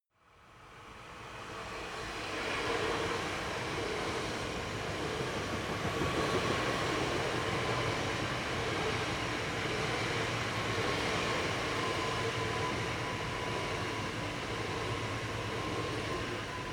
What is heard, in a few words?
train small german passing bright